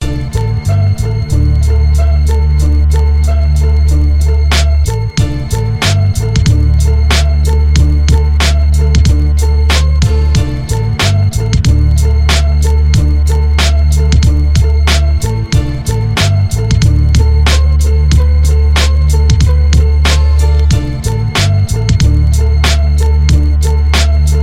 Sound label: beat